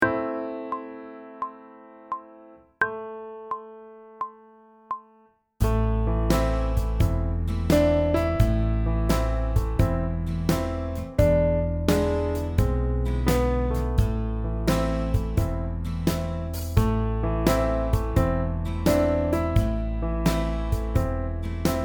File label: Sight Singing backing track, pop vocals; Grade 2